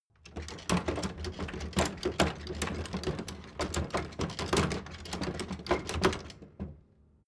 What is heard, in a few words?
door flap loft rattle